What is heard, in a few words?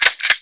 ammo pickup; Daikatana; items